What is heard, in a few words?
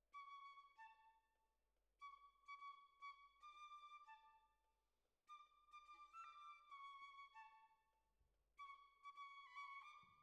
Violas Over